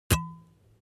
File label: object bottle glass drink stop soft